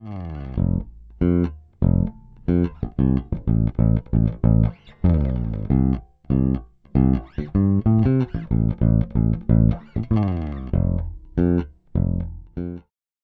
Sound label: DI Solid; Zoom B2 Four